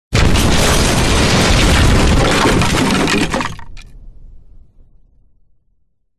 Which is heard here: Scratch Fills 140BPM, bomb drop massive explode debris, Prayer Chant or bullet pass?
bomb drop massive explode debris